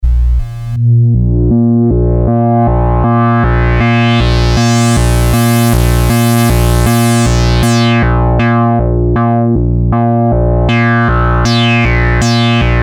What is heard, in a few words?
Pulse Lo Pass; tinysizer